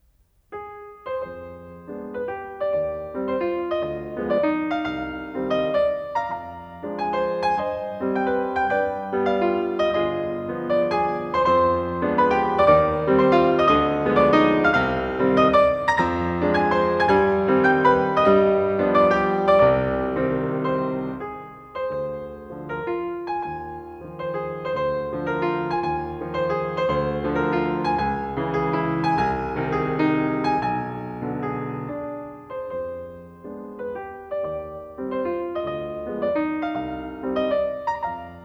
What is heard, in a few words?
Carnaval for piano; Schumann; Op9; Rubinstein